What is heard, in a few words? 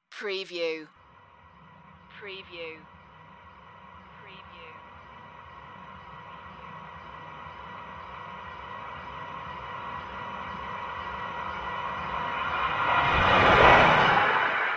hollow space flyby